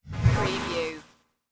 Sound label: robotic interface message